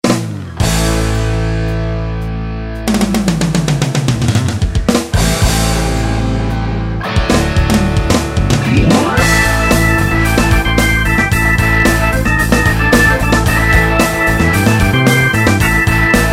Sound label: Rock Band